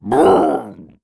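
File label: gladiator damage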